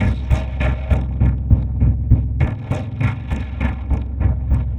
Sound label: perc 100 nasty pump, Percussive Loops, 100 bpm